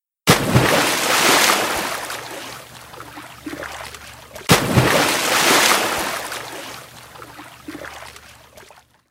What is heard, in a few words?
swimming pool